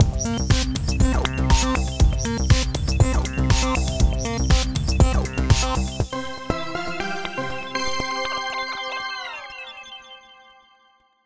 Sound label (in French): jingle